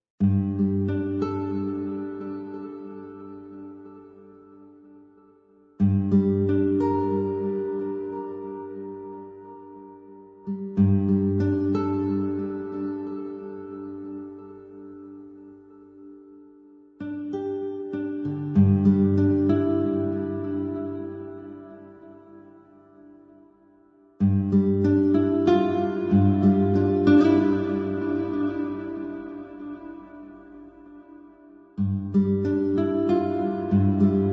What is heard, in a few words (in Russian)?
Zvuki Antichnosti; LIRA